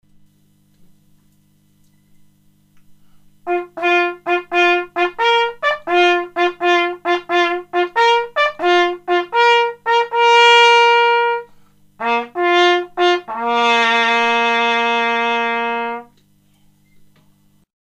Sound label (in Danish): kaninen